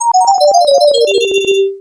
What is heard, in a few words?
Game Over; ringtones; Notifications; Arkanoid 2